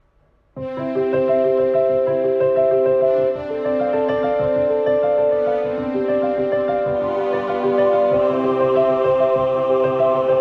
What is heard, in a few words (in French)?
Prélude orchestral version